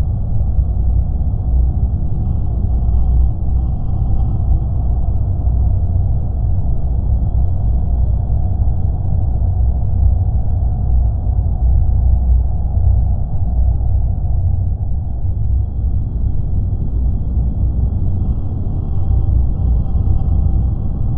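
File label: necroid ambience construction ship hum; Stellaris; necroids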